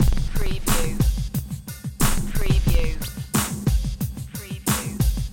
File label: Hip Hop Drum Loop 90 BPM - Straight Without Crash